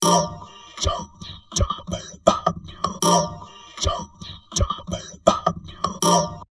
TALK, Drum Loops Crazy Processed

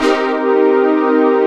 Synth Pad Cm7; Digital Sound Factory Xtreme Lead

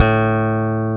POP A2, BASS; AKAI S-Series CD-ROM Sound Library VOL-8

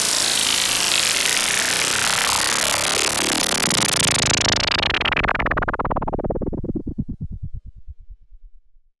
Sci fi

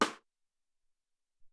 Heavy Rim 2, Alesis SR-18

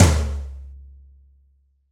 Room Tom 1 alt, Roland BK-5 Toms

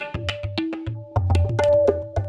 TABLAS; Zero-G; Chemical Beats

